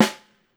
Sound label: STR. SNAR; E-MU Producer Series Vol. 7 – Old World Instruments